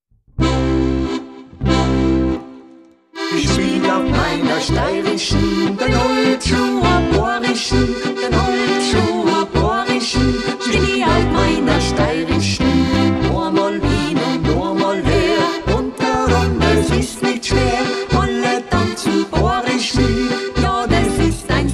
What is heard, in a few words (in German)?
Holzschuh Boarisch, Steirischen; Spielen und Singen mit der Steirischen